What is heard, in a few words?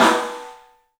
STEEL, Drums, ROOM SINGLE; 3D Sound